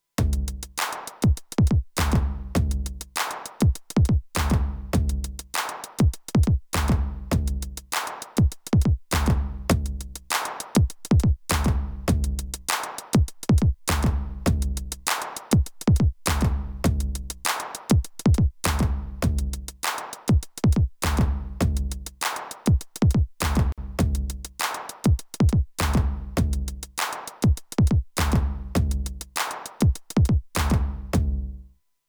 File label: Warm Audio Comp Beat